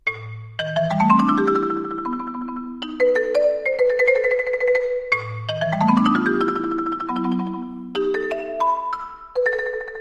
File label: Marimba